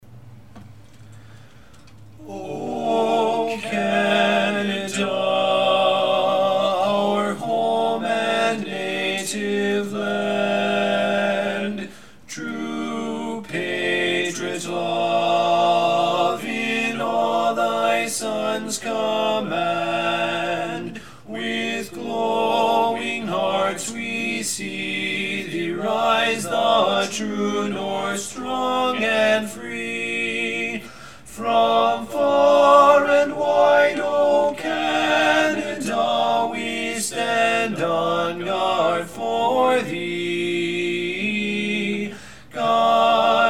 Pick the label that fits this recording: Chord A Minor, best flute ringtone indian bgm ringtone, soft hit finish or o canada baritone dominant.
o canada baritone dominant